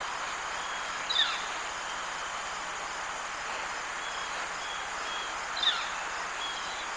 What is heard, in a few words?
bird